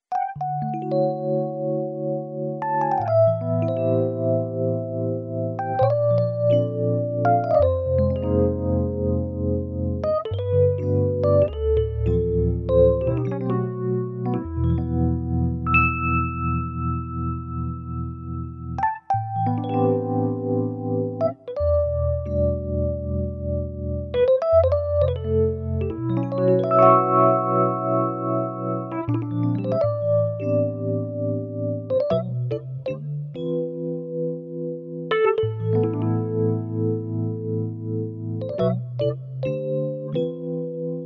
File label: nord stage